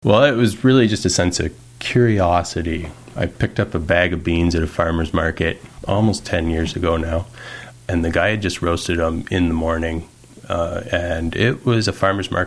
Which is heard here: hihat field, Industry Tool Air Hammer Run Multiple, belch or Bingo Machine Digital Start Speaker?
belch